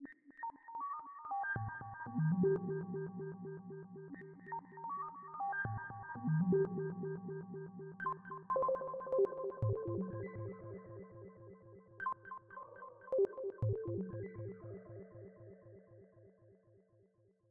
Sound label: puter; roomeyes; 1 MISSING FRIEND